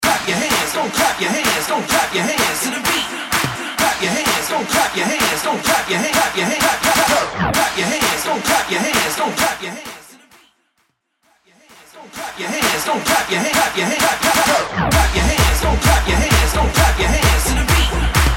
90s Tribal Mix Bootleg Mainstream Clap A Pella Start; Mainstream VIP